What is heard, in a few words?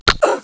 female fall pain